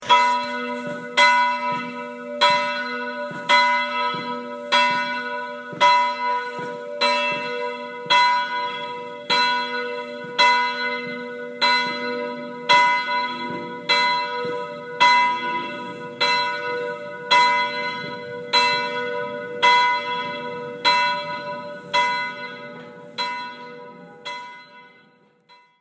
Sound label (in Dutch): klok